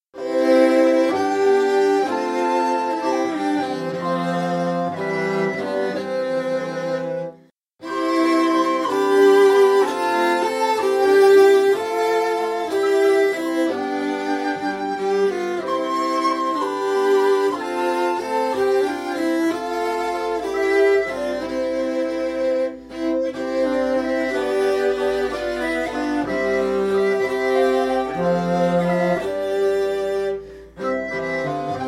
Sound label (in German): Schlaflied Playback